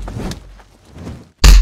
slam, weapons

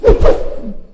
tail swing

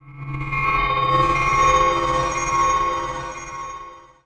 strings, magic; Paradise

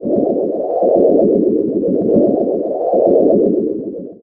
metroid idle; CHOMPStation2

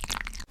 insertion; VOREStation

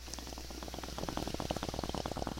bong hit

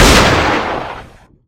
Gunshot deagle; VOREStation; weapons

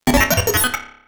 interference; Polaris

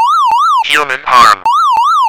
harm alarm

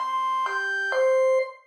announce

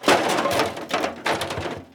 electronic assembly emptying

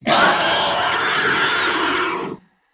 screech, alien voice

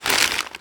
wrapper, items pickup; Aurora.3

